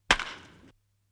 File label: Baseball Bat Wood Hi; baseball fx & games